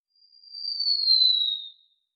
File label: damage hits explosions, Warships